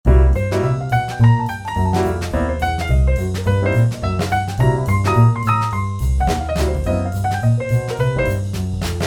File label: bluenote jazz